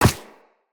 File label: Walk Ice, Footsteps; Operator